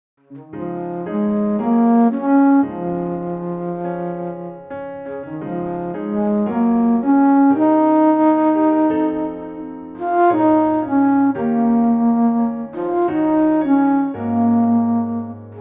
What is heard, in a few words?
Bass Flute; Orange Tree Samples